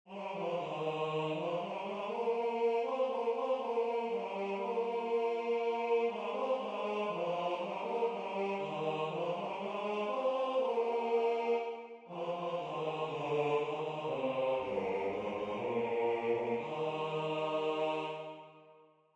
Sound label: bacchanal bass; Christmas 2026